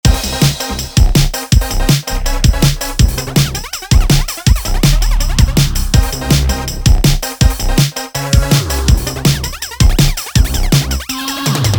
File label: Presets with Drums; junorator